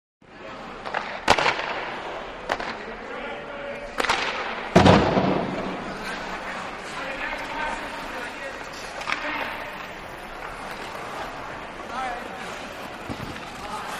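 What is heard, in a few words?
Hockey Players Practice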